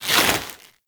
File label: Sword And Whip Pull Back; Warframe; Weapons